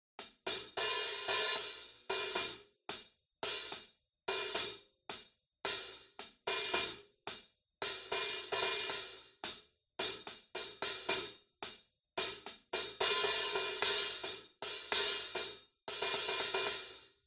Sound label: hihat replaced